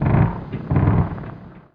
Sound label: Creak Deep Wood; SFX Kit; Creaks, Scrapes, & Misc